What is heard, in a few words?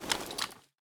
sniper holster, weapons; STALKER; IWP